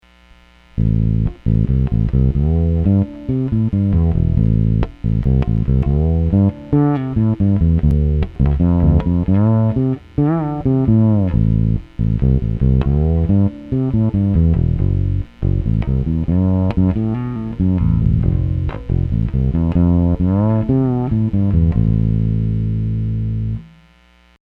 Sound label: tapes, four string fretless semi hollow